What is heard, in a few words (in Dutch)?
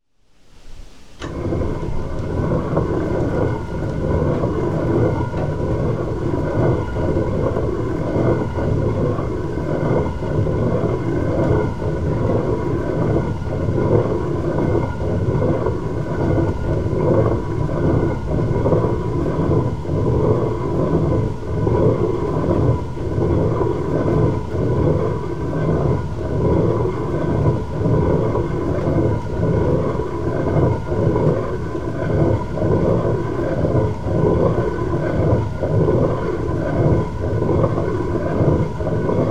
molenstenen malen